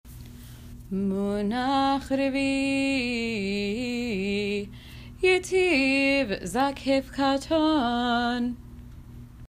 munach revi i yetiv zakef katon; 4. Munah Revi’i Yetiv Zakef-Katon; Cantillation for Haftarah; The Jewish Theological Seminary

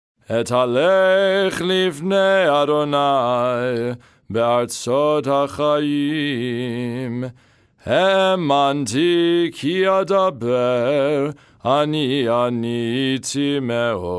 nusach ukrainian dorian; Psalm 116 v. 1-11 (Nusah Ukrainian Dorian); Festival Shaharit (Lower Voice)

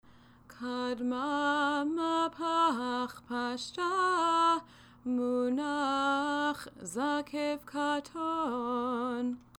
Kadma Mapach Pashta Munach Zakef Katon

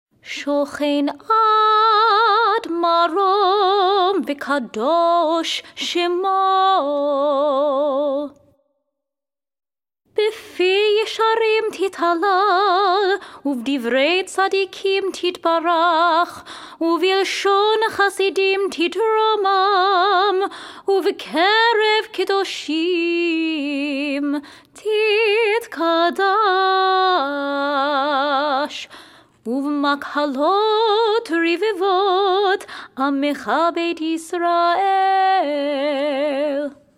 nusach minor; shochen ad; uv makhalot; yishtabach

